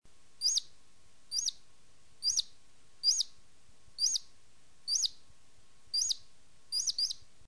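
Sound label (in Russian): крики предупреждения об опасности у сусликов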